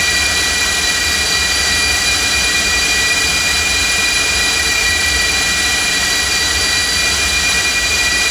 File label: F-5E J85GE RPM1; DCS